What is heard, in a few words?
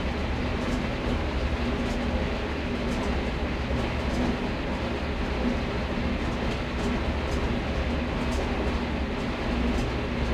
panzer6 engine int rpm, engines tanks; War Thunder